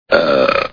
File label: burp; barney